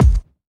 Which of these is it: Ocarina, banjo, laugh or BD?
BD